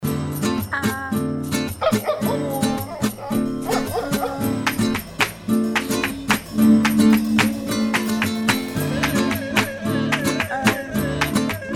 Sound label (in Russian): Rington gitara